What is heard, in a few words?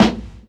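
steel snare drum sound a key, tuned to the A note; Channels Mono; snare-drum-samples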